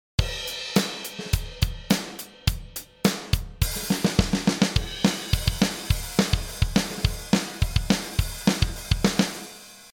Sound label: Double Time